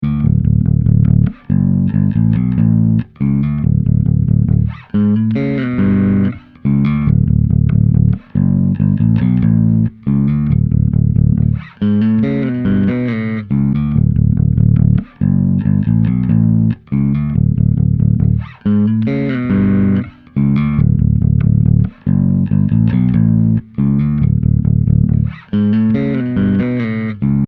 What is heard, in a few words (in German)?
klangbeispiel 2 finger